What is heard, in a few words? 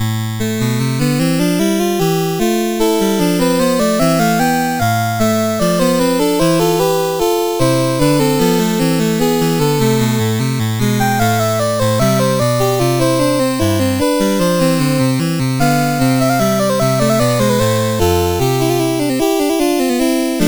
Voluntaire No42, CSG8580R5